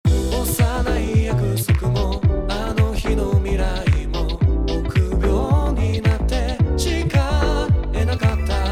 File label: chord Wet